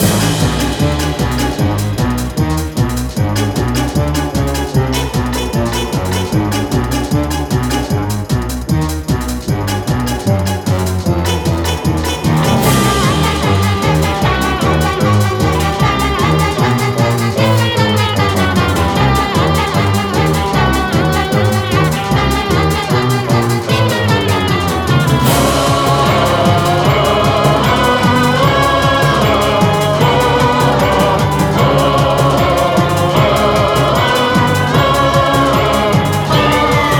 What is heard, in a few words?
Music Winning; Beanstalk